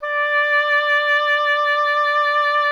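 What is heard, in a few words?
WIND OBOE; Miroslav Vitous - Solo Instruments VOL-2; Solo lnst. II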